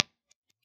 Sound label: 4x12 V30 MD421; 0.5in dc